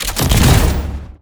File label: p atk shotgunx